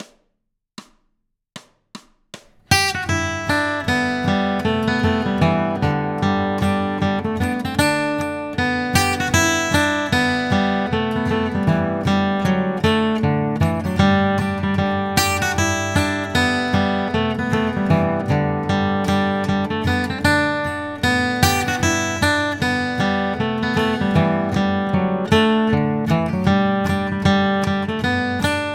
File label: Bluegrass Guitar, easy fiddle tune in G